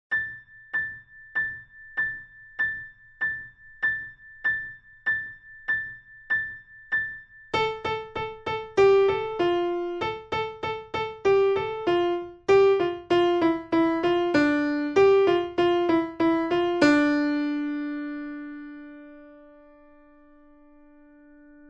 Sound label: Studie Linke Hand RH T96; Bartok